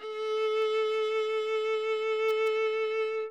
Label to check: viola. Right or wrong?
Right